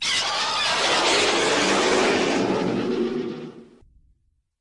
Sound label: roar; dark ghidorah